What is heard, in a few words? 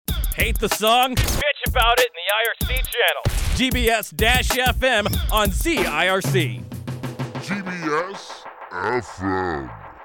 jingle